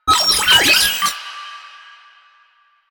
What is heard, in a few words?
Sniper Drone Vocal; Enemies; Drones; Warframe; Lasrian